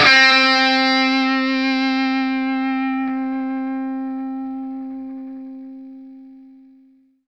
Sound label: SINGLE C, Vintage Blues Guitar, ELEC.GTR; AKAI; USB Soundscan vol.22